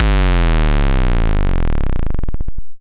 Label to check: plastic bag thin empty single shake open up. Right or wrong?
Wrong